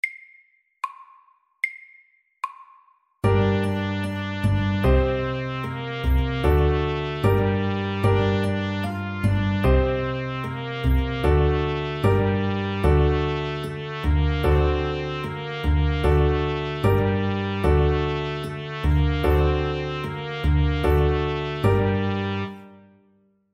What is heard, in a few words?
SLOW 75